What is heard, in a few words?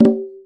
Single Hit, Conga; percussion; musicradar